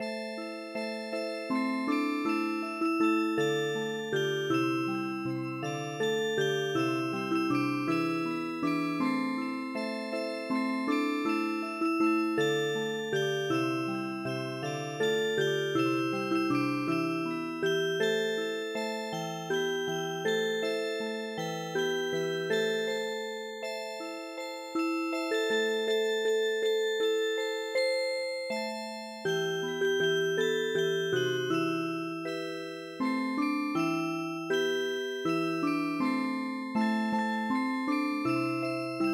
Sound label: Music Box, traditional; El Pajarillo